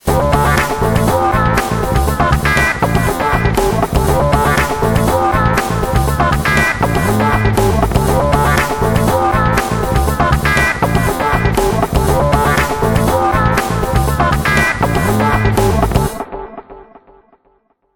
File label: Flutefusion; Micromax W900